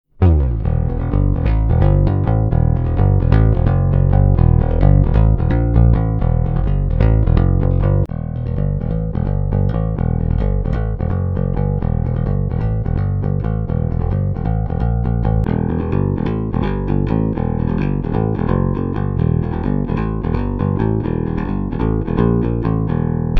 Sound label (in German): StarBass Plektrum Hals Beide Bridge